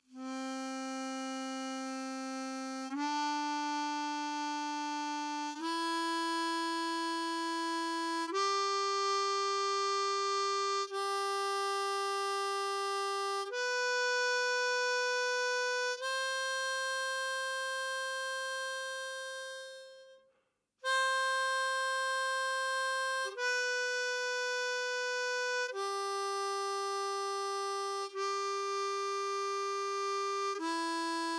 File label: Scale Lower Octave, Blues Harp